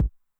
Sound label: BD Low Tune Short Decay, bass drum, 909; classic machines; 909mod